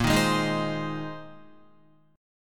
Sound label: A sharp 7th Suspended 2nd Suspended 4th, Guitar; A#7sus2sus4; strummed; 6,6,6,8,8,7